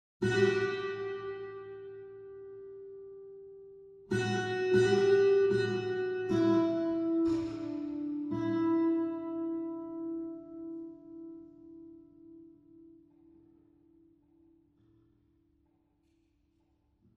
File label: stage guitar; sidney opera house